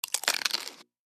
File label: Chicken Crispy Bite S